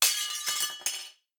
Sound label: monkey Throw; jumanji